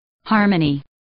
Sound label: harmony